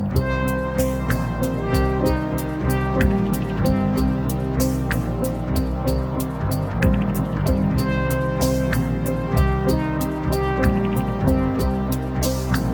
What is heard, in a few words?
Electro